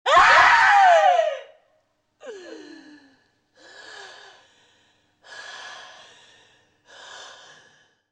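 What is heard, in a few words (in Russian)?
женский крик, обратное эхо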